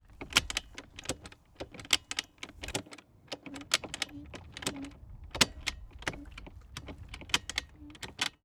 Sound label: Moto Bike, Changing Gears, KTM 250 Enduro Motorbike